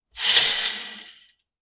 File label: open a curtain; theater